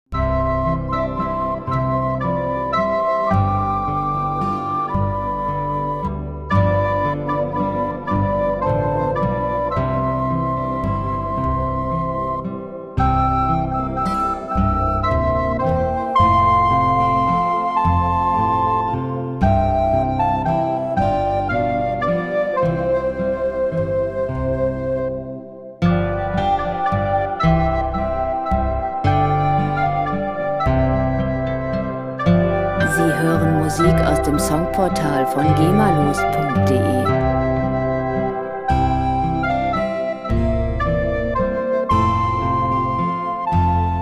sweet bells

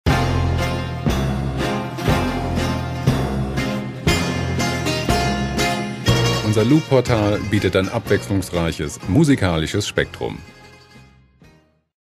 rhodos

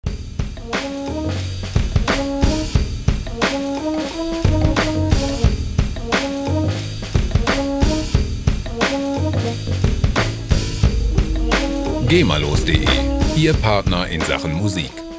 old style

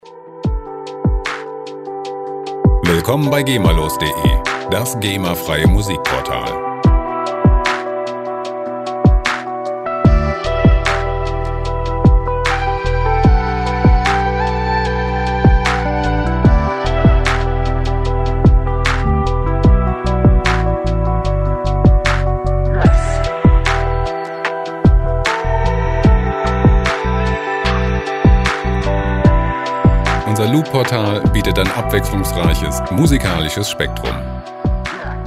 chill hop

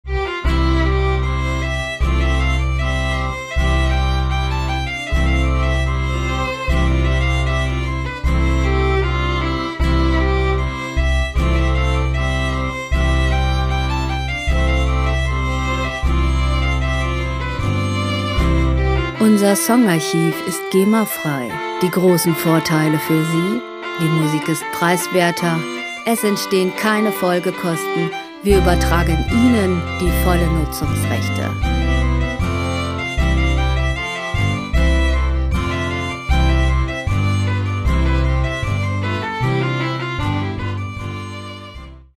fiddle

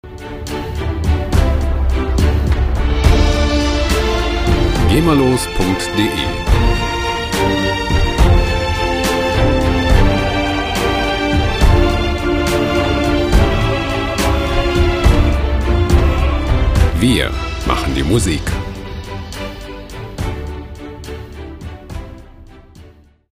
epic

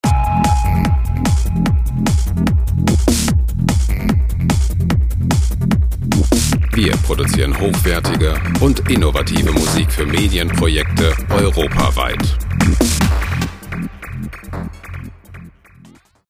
minimal

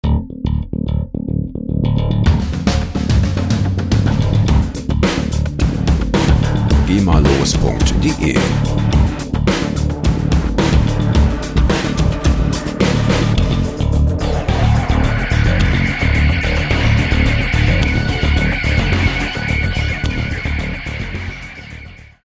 metarock

